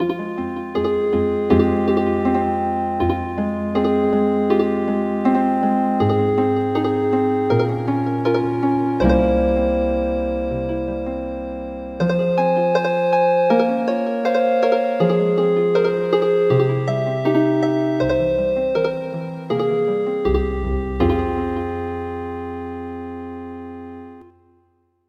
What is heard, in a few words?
Armenian lullaby Healin Harp